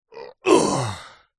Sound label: male voice groan relief after lifting heavy weight; zapsplat